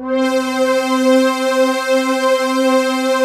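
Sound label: fatty sweep, JV PADS; Pro-Rec - Synthsonic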